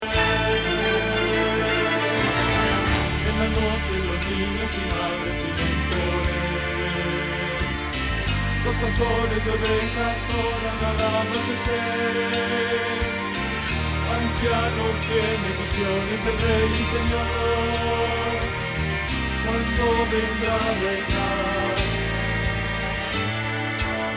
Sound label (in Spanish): Bajo